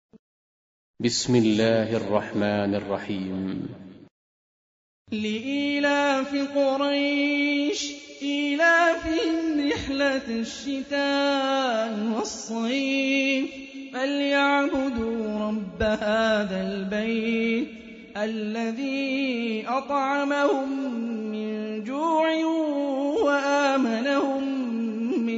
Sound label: Quran; Hani Refaey